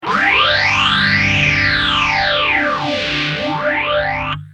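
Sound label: guitar sph323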